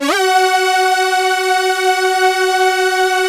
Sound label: F#3, LEAD SYNTH; Best Service Alpha Dance vol.2